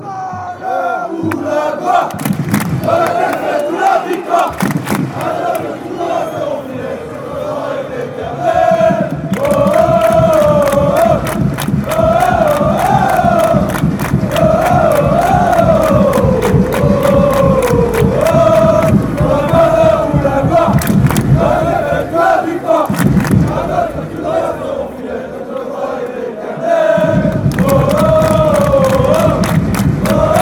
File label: oh oh oh, fanchants